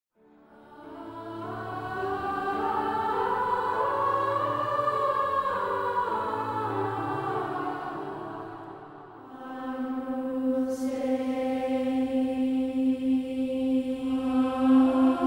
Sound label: Boys Choir; Spectrasonics Vocal Planet CD6; Groove Control; Symphony of Voices